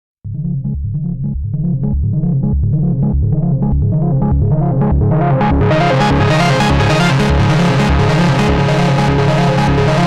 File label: drone with sub37 arp and filter